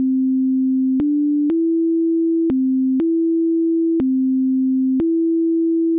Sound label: do ray me